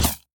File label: arrow large